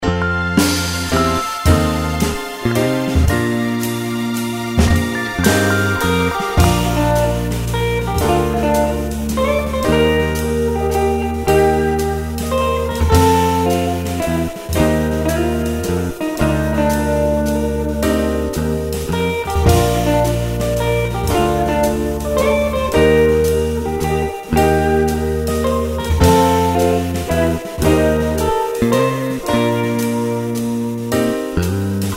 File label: Jazz